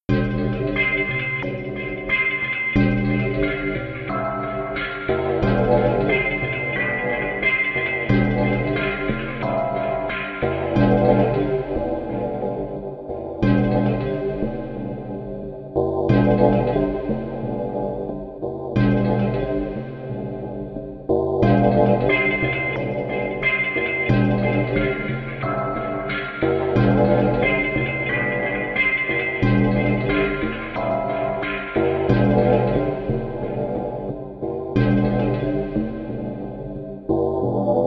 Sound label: Gamelan